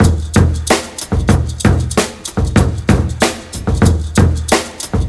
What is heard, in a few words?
94 bpm drum groove e key